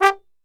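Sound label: G 2 trombone shot, Brass; Best Service; Brass Super Section; TRMB SHOT; 90 s Sample CDs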